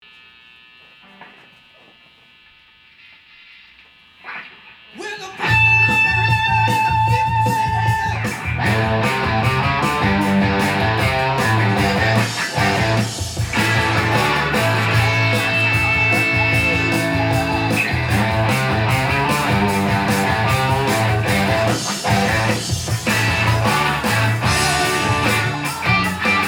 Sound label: 1964 PAT Decal Super Reverb; 1964 Patent Number Decal A5 Fender Super Reverb 3; Vintage-Style Humbuckers